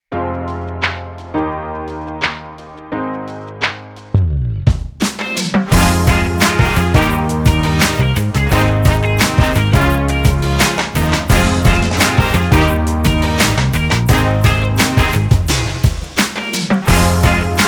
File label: instrumental